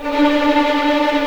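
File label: D4 tremolo violins, right; East West; The Ultimate String Collection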